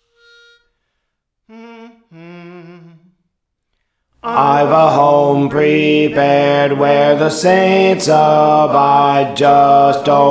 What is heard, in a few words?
Alto, Songs; Praise For The Lord Training; Just Over in the Gloryland